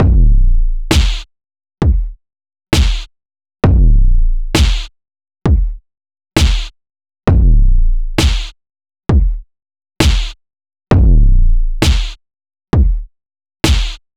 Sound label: Boom Chuck Halftime; super crush k.o.